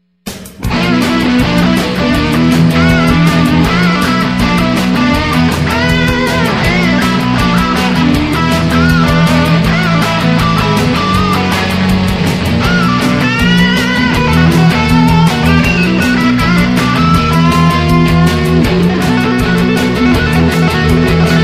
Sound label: bass